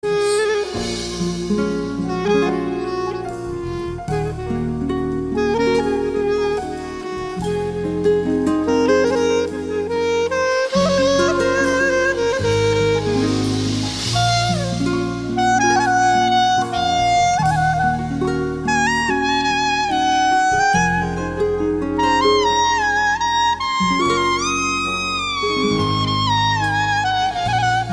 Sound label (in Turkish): Klarnet Duygusal